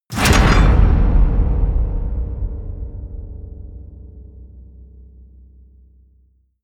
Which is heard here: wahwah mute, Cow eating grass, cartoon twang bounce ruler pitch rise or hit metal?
hit metal